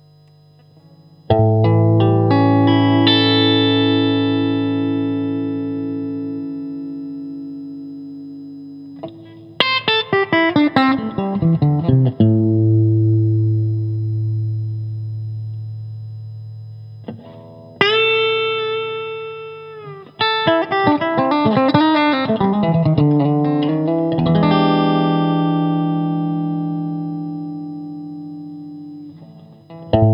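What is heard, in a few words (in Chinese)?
60S OCC 单晶铜 清音 2档, acoustic guitar; kindman 60s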